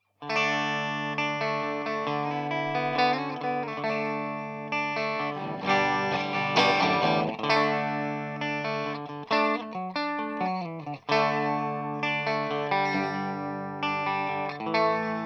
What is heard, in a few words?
Retro 55 Strat